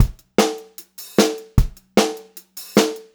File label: POP VA, Pop-Rock Drumloops; USB Soundscan vol.37; AKAI; 90 s Sample CDs; 152 POP